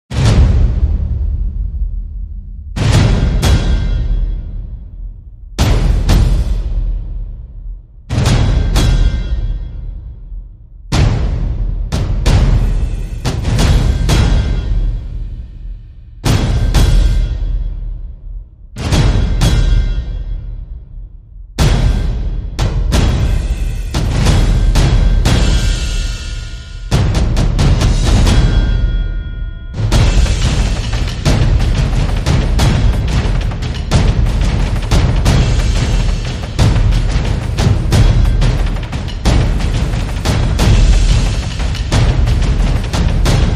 Drums

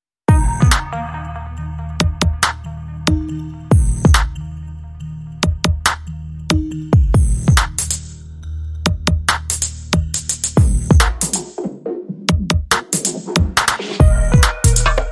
No Vocals No Glockenspiel

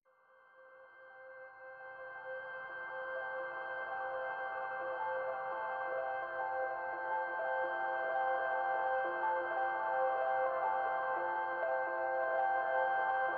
Ambient Mix

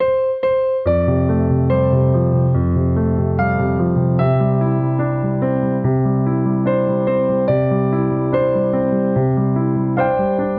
Solo Piano Happy Birthday

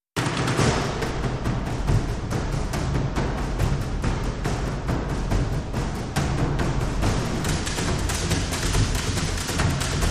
Only Drums

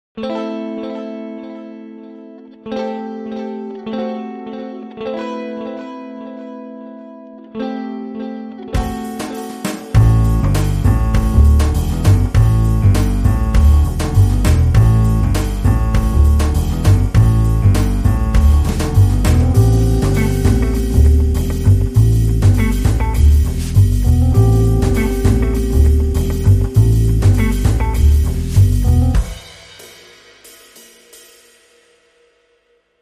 Fuzzy Jazzy Short Rhythm

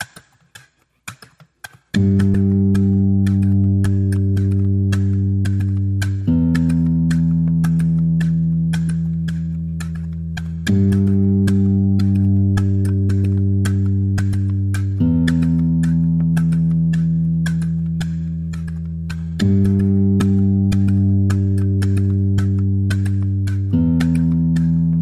Percussion and Bass Mix

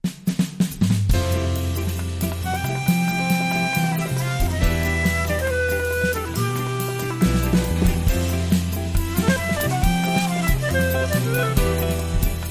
Flute and Guitar

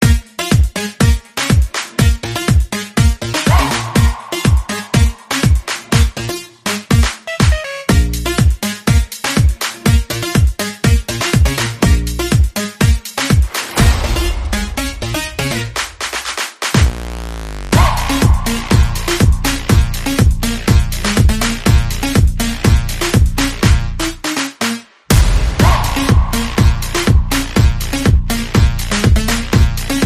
Mambo Electro, No Horns

